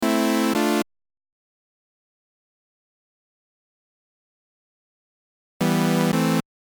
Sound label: chords